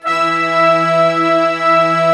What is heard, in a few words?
Orchestra; 90s Sample CDs; Club-50 - Foundations Roland; Sy Str